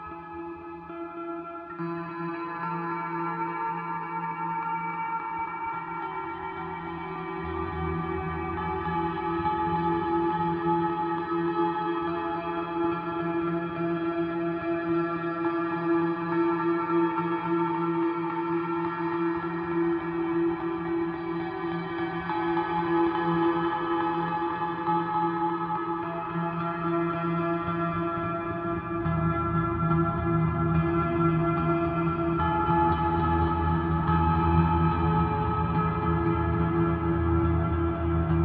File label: Gitar